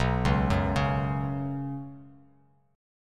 B Suspended 2nd, Bsus2 chord, Bass Standard; Bsus2 arpeggiated; 7,4,4,6; JGuitar

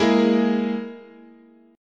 G sharp Suspended 2nd Sharp 5th, chord

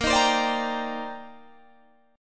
C Minor 9th Bb, Guitar Standard; Cm9/Bb strummed; JGuitar

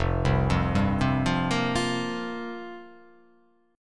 F Suspended 2nd Suspended 4th F down

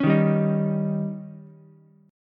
Db Suspended 2nd Flat 5th chord, Ukulele; strummed; JGuitar

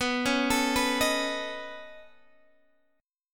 C sharp 7th Suspended 2nd Sharp 5th B

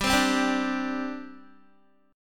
G Suspended 4th, Guitar; Gsus4 strummed

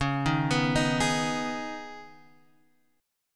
Db 7th Suspended 2nd Db, down, Guitar Standard; Db7sus2 arpeggiated; JGuitar

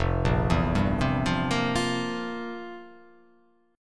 F Major 7th Suspended 2nd Suspended 4th, chord; FM7sus2sus4 arpeggiated; JGuitar

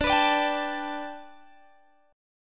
G Major Add 9th Guitar Standard, chord; Gadd9/D strummed; JGuitar

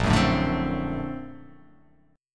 A sharp Augmented Major 9th, chord; strummed; JGuitar

